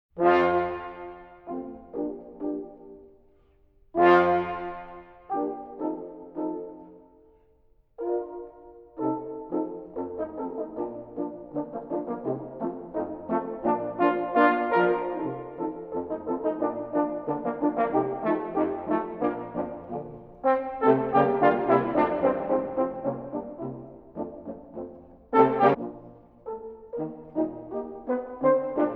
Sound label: Pizzicato Polka